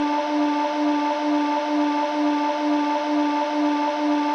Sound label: kawai k1 SHAKUHACHI LOOP; wavetables